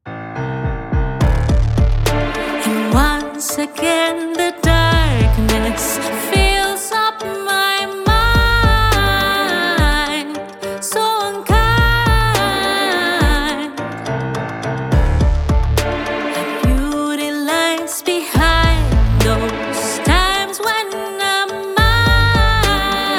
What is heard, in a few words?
Waltz